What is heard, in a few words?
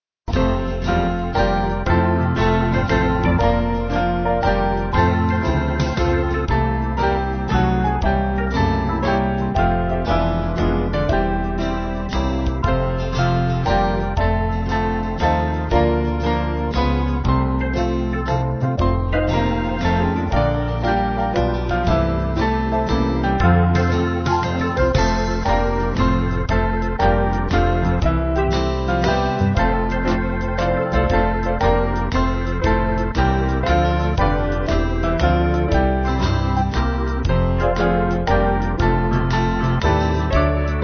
Band